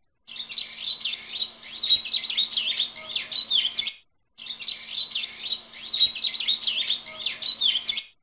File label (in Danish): Fuglekvider; Kroppedal Museum; Alpegården